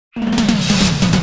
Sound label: Fill 140 BPM